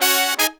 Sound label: Horns, 110BPM